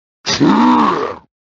the sound iron knuckle makes; Ocarina of Time

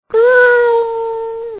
cat

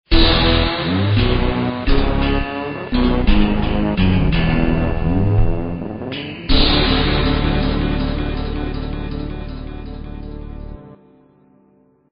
draw music; vapesquared

